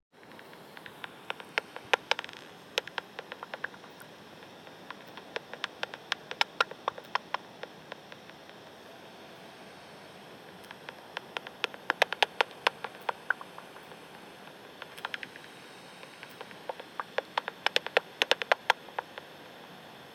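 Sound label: bat sound caught with self built detector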